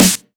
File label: Snares; Vengeance - Essential Clubsounds Vol.4